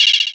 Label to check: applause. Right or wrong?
Wrong